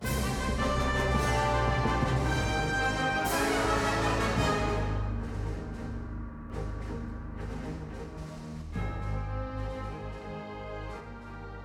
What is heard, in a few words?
Quest Completed; MonsterHunter